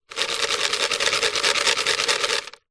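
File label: household medicine vitamin c tablets shake plastic tub lid off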